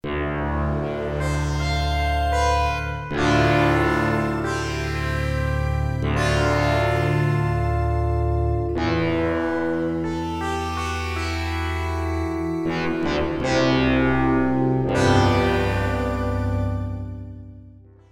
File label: dry pad